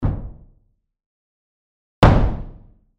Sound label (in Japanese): dadaiko